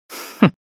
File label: hmph